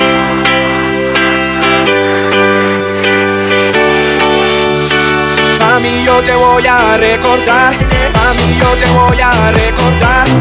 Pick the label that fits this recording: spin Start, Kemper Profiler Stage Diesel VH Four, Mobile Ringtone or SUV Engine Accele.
Mobile Ringtone